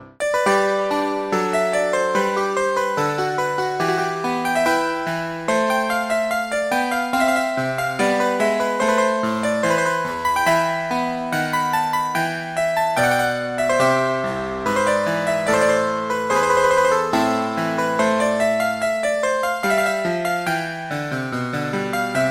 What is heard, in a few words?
synthetized claviharpsichor